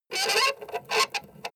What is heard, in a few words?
treasure handle squeak